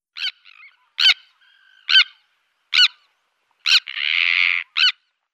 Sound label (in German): Brandseeschwalbe Sterna sandvicensis; Vogelstimmen